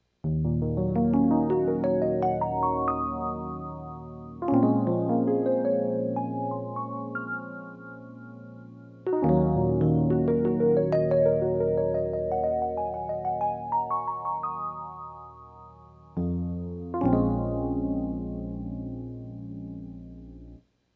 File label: E Piano